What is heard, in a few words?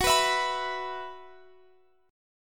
Gb 7th Suspended 4th Gb down Guitar Half Step Down